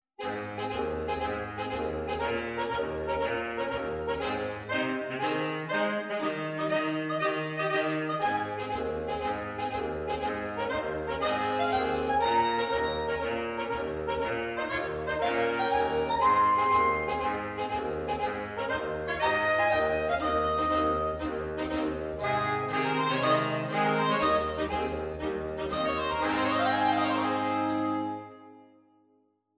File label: Eb Staccato; simplybrass